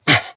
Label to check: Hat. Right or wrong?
Wrong